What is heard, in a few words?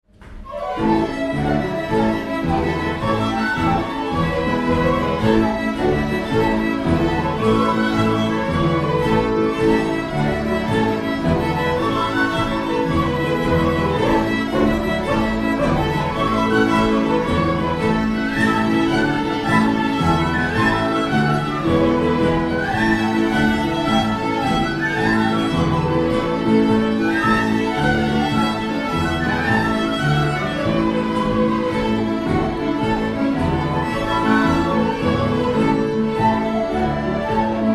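Jig, bush music in arranged parts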